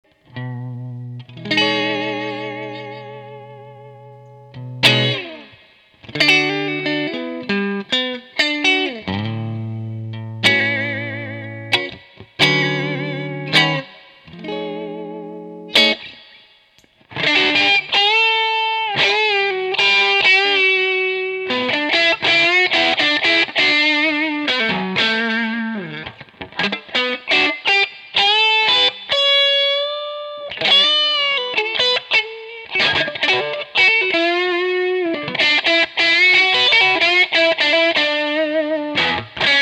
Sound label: Lo Gain Bluesy; ScrewDriver